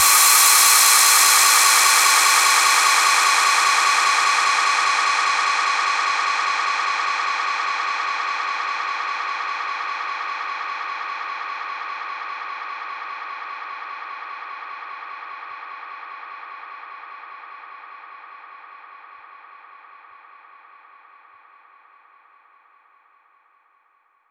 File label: Downsweeps